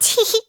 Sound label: teehee; monokuma kid